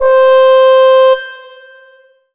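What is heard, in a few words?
Yamaha SY22 French Horn C5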